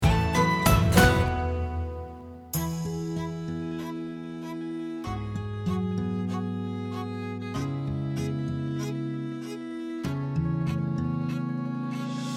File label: Hero Entrance, instrumental